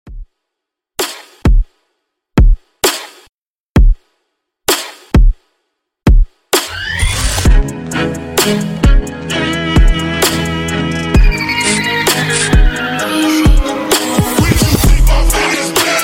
Extended Trap Redrum

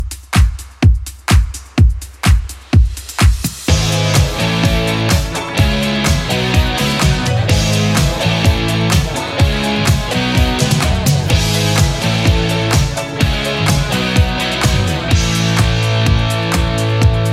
80s Club 126bpm CLEAN

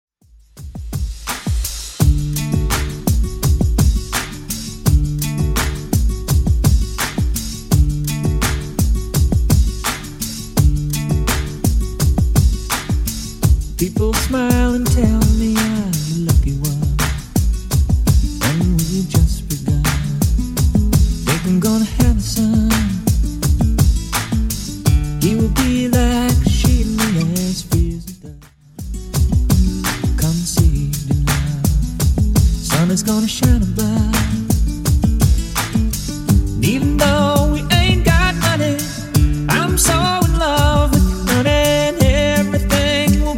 70s Redrum 84bpm

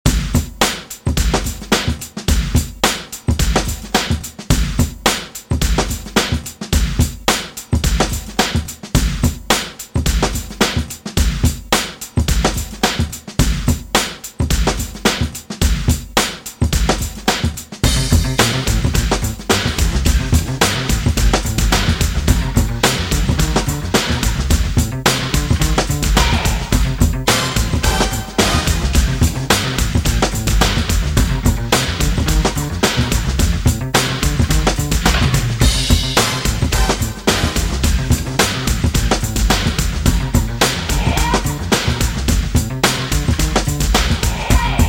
Funk 80s Redrum 108Bpm

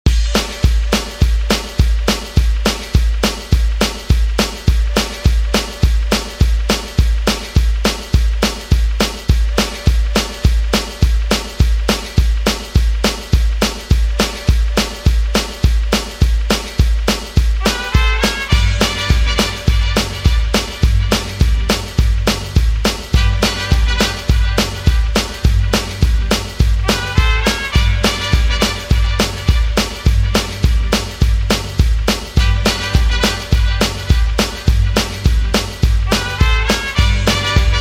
60s Grid Redrum 208Bpm

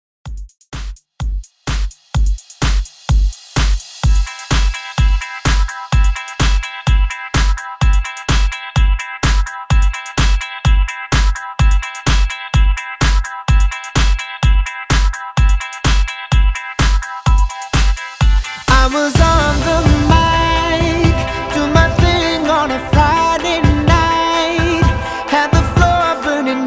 REDRUM 127 BPM

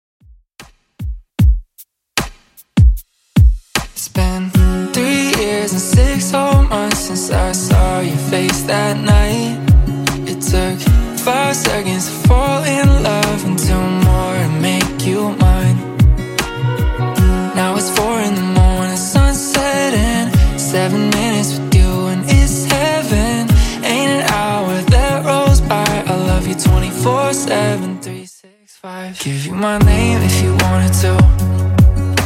Top40 Redrum 76bpm CLEAN

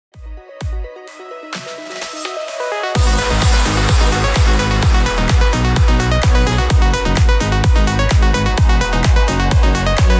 Progressive 128 BPM

